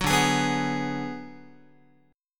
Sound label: D 6th Flat 5th D down Guitar, chord; D6b5 strummed; JGuitar